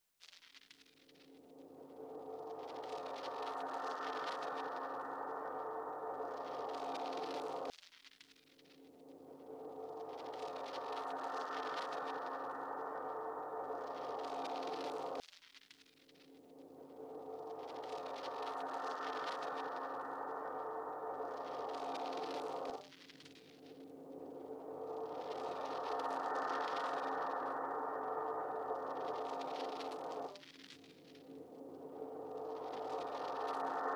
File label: Ambience